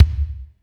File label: kicks